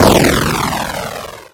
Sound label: missile